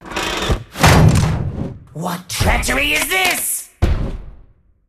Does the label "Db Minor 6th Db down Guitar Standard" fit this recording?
No